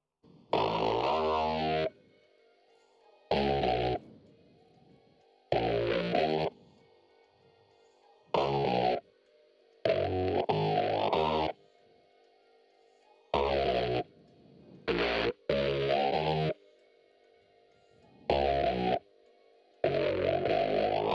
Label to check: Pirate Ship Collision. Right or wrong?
Wrong